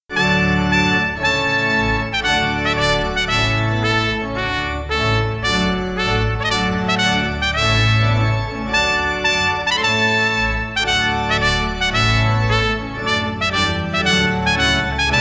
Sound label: Trumpet Tune, wedding